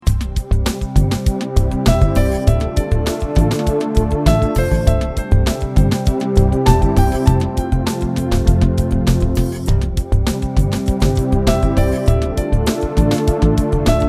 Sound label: groove; BankBoston